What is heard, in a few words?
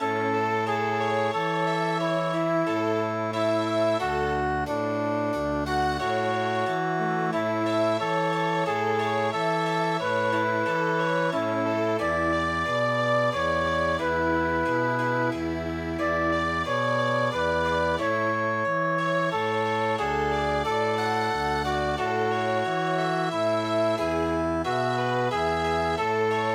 Chamber Music; Laura i Filon